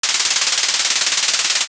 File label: shuffle